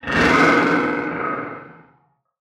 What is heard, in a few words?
FRACTAL GUARDIAN death; Legacy